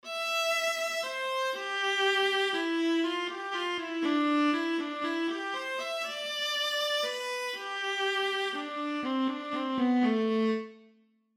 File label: Alto Clef Example Viola